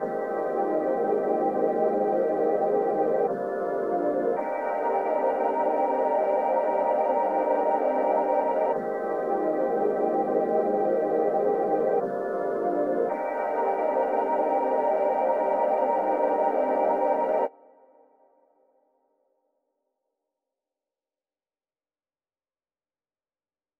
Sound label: 110 BPM C Min Air; Melody; RnB; Loop Stems; Cymatics; 2022 Melody Collection; Seduction